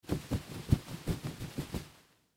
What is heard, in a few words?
Towel Shake Out; Household; Bathroom; Blastwave